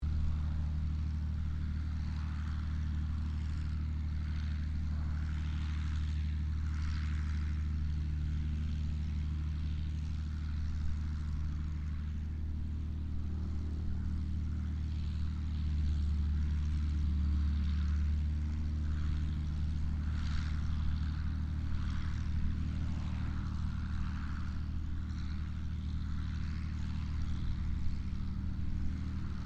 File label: AIRCRAFT P-40 KITTYHAWK IDLE DISTANT; P-40 Kittyhawk / Warhawk / Tomahawk; Airplanes